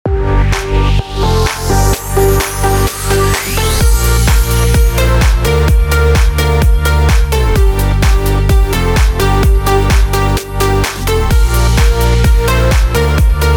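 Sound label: CHRISTMAS A major 128BPM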